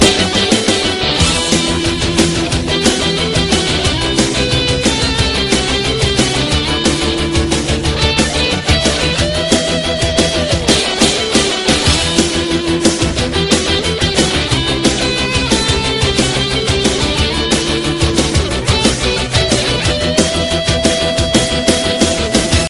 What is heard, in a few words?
guitar nice ringtone